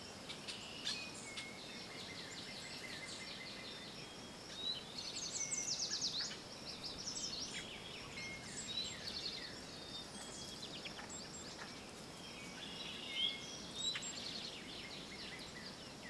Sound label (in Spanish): Ambiente Valle